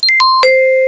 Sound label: vibes; Amiga-CD 1996 #5-6; sampler; Instruments